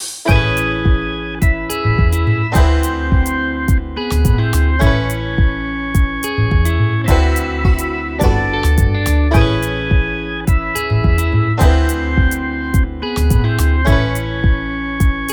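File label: BGM Salon Result Lose Table; clubhouse games 51 worldwide classics 2020 switch gamerip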